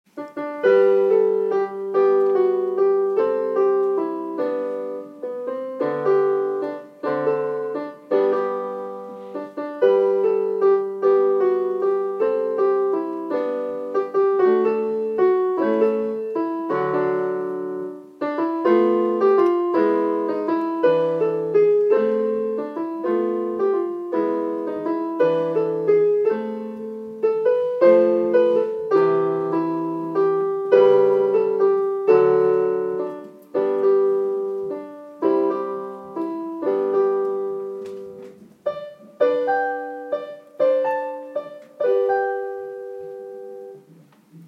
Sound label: piano solo